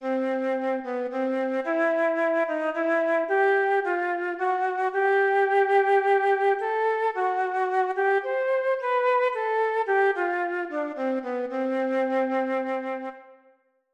Mel dict chromatic long

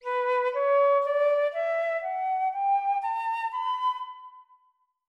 nat minor B4

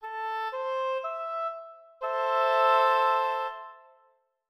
triad minor A4

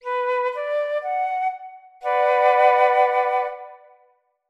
triad minor B4

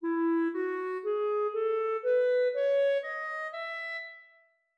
major E4

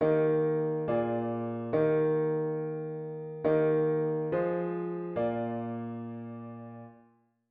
diat root w bass

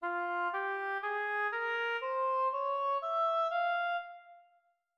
harm minor F4

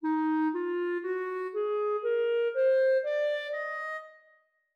mel minor D sharp 4

